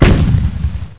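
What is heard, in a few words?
shotgun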